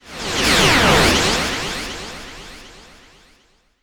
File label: Stage update; Pac-Man Championship Edition 2 Plus